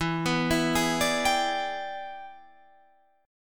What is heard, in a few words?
E 7th Sharp 9th Guitar Open D, chord